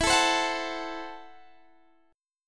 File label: C 6th Flat 5th E